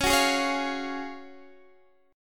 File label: Gb Major 7th Suspended 2nd